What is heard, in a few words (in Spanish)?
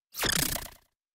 Grito; Sinistea